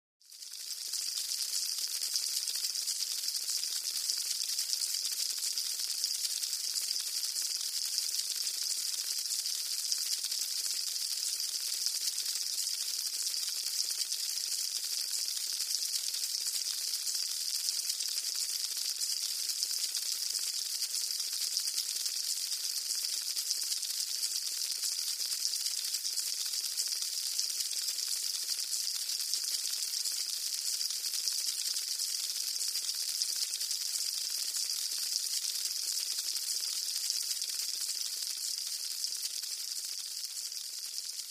Beetles, Insect Clicks; Beetles In A Pyramid

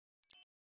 Flash Beep HF Shimmer, flash beep

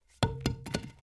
Baseball Bat Wood Dr; baseball fx & games

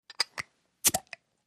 Beer Bottle Opn Opner

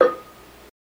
Glass Clunk Single

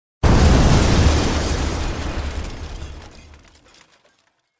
Explosion Debris, metal & glass

